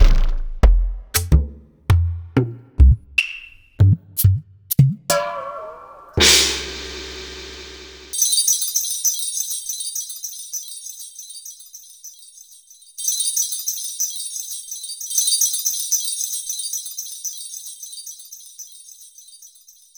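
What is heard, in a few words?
Roland MV8800 drum ETHNIC KIT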